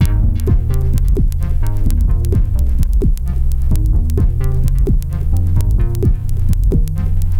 Combination Rhythm

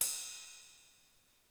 Ride, Percussion; Giga Samples Collection; ELQ-DrumQit; rock toms

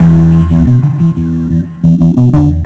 Processed Electric Bass; Sonic Foundry (Sony Creative Software); Modular Electronica; Basses

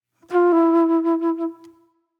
Mellow Flute

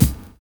LOW TOM O; Univers Sons - Kitch 70s

